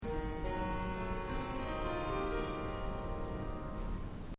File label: Dulcimer A dim